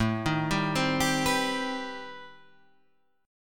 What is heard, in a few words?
C Minor Major 7th A